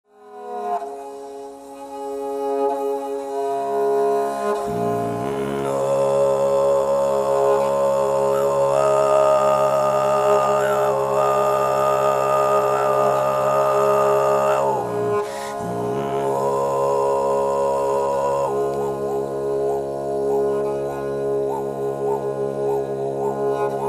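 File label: harp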